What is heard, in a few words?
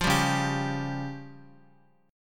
Db Major Flat 5th, Guitar; strummed; JGuitar